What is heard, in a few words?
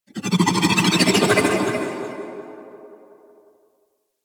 Mountain Pass Sculpture Contract; Warframe; Ambience; The War Within